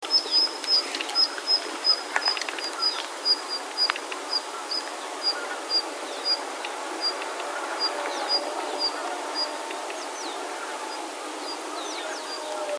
joubi

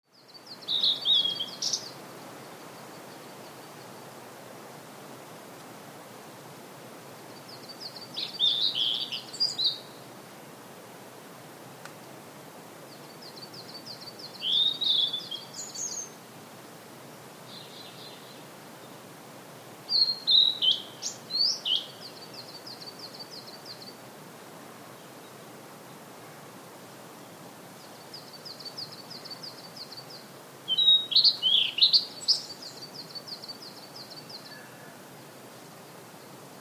oruri

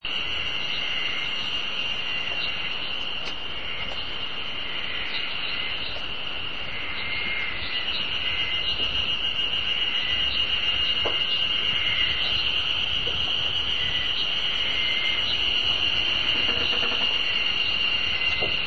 harusemi

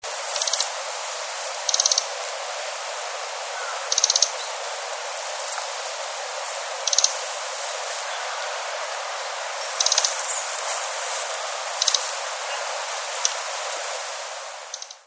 ojirobita

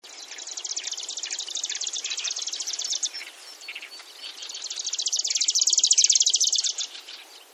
osekka

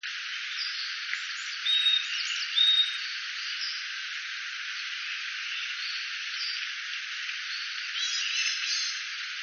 yamaga

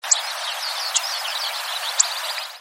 nobita

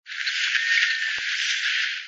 kurogamo

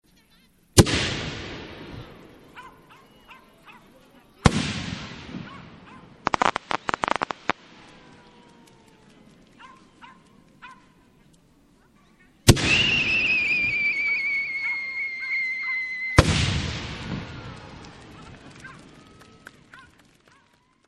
hanabi

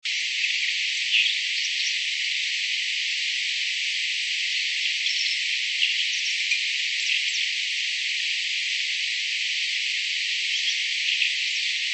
kawagaras

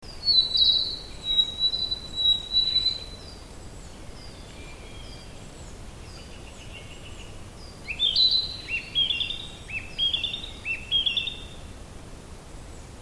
kibita

